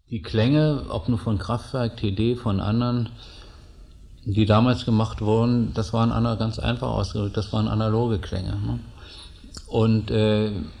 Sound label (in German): Elektronische Klangerzeugung